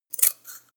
scissors cut paper

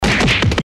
fist hits

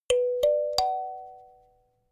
notification kalimba triad up minor high

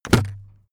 aluminium case close hard

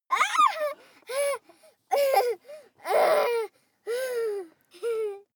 child laugh tickle easing

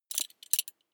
metal belt buckle clatter regular

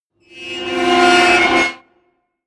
metal metal grind large

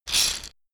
shaker wood rough hit single soft

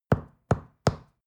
stone wall knock

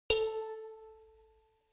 notification kalimba rattle

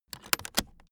aluminium case close buckle

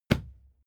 fight impact sweetener cloth muffled

